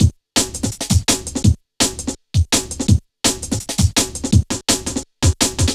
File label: Build Up, Jungle, Drum Loops 166.5; Zero-G Jungle Warfare 1, 2 & 3